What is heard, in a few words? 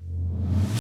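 Tom Reverse, Yamaha DTXpress Electric Tom; 300 Drum Machines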